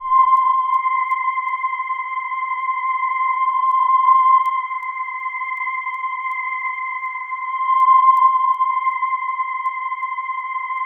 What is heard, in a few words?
C5, Synth Leads